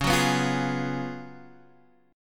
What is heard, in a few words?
Gb Major 7th Suspended 2nd Suspended 4th